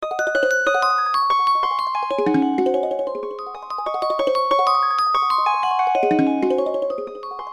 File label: wind note